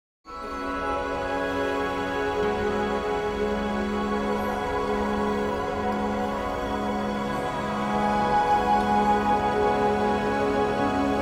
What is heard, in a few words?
female choir